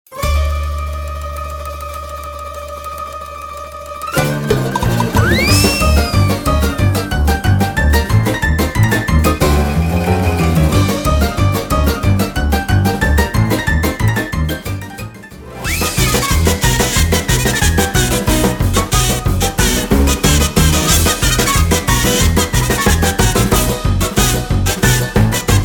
comical chase music

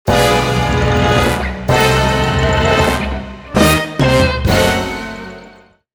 Evil Stabs

